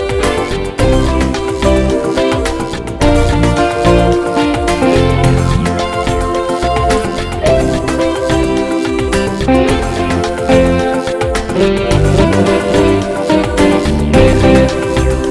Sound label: Happy and Pretty Funk